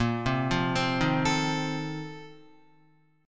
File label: Bb Major 7th Suspended 2nd Suspended 4th Bb down Guitar, BbM7sus2sus4 chord; BbM7sus2sus4 arpeggiated; JGuitar